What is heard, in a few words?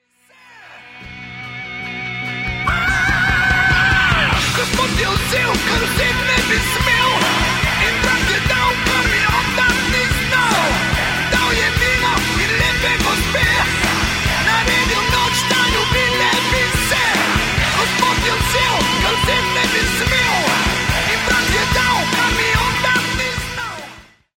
Rock 'n' Roll